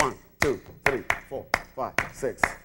subdivision of 6 beats starting on first partial loop